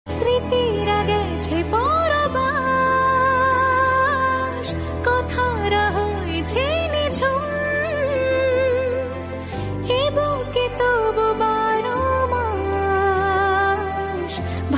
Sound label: Bengali song ringtone